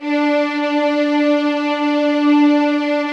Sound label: D4, LOUD VIOLINS; Q Up Arts; Denny Jaeger Master Violin Library